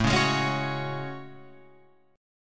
A Major 13th, down Guitar Open F; AM13; strummed; JGuitar

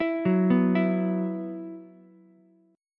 Gb 7th Suspended 4th Gb, Ukulele; arpeggiated; 9,6,7,7; JGuitar

